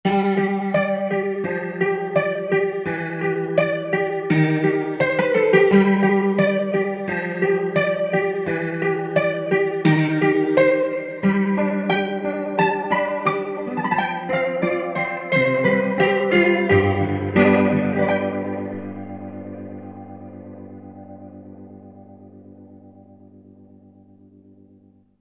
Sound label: Piano; Roland SRX-03 Studio SRX; OctopusPiano